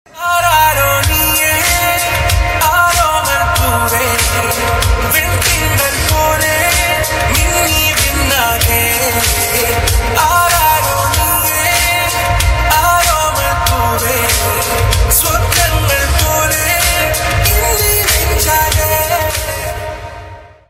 malayalam song ringtone bgm

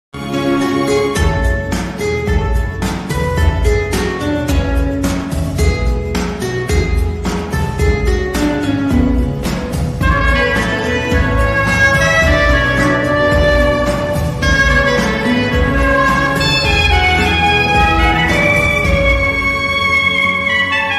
kannada best love feeling bgm ringtone

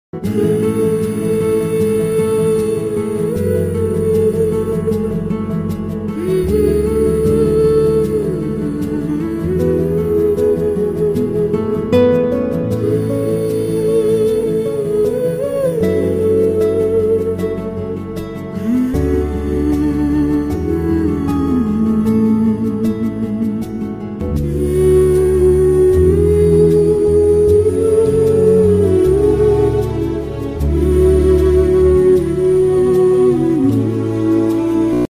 tamil love bgm ringtone, melody bgm; vinnaithandi varuvaya; vtv

